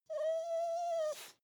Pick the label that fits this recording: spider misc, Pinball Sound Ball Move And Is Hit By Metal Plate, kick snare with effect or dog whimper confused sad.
dog whimper confused sad